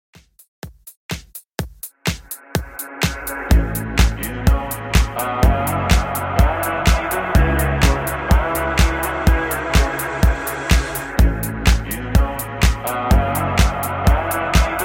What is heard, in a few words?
Dance Club 125Bpm